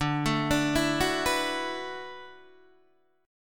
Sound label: D 6th Add 9th, Guitar, DADGAD